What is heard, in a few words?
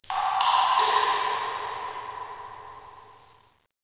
drip